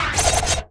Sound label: dobles